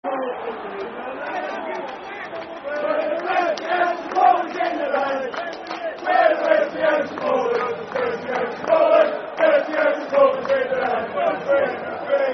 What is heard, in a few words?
Best Behaved Supporters Football Chant (Soccer Song); Millwall Mfc